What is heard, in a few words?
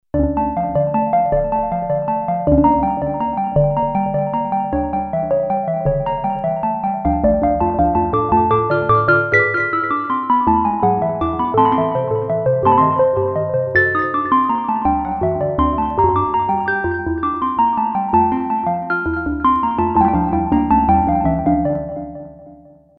synth; Creamware minimax; bach